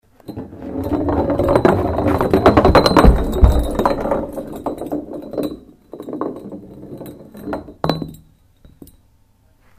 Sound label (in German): Flasche; B-Movie